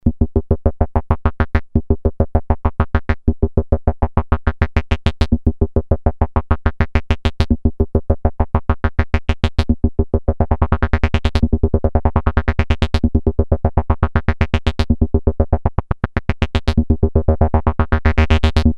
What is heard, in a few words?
LFO